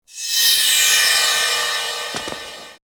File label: Entering Magic Cave; starfox